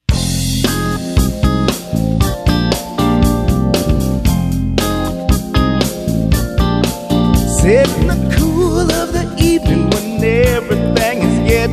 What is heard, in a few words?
spooky